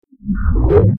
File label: time start; FXHome